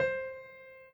piano

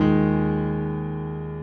piano low

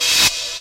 cym

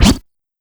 normal hitwhistle

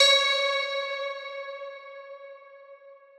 Crystal C#6

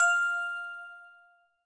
bell

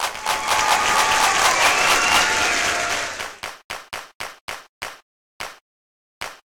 fail sound

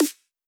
snare G#4

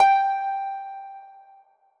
G6 harp

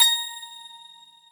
bell A#6

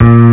bass; osu

